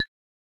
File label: ui click; raycaster